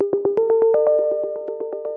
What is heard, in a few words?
122 BPM Arps A maj G min Tonic G; arp essentials